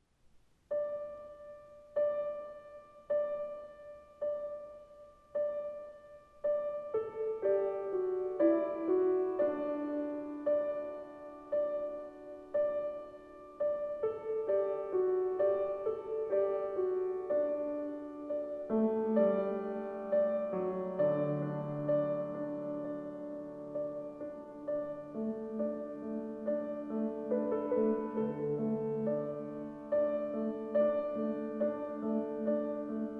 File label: Piano Improvisation